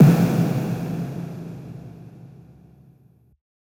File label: One Shot FX Tom, Deep House; One-Shots; Ghosthack; Advent Calendar 2019